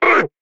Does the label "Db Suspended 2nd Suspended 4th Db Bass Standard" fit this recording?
No